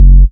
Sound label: 127 DR, Fresh Disco House; USB Soundscan vol.20; AKAI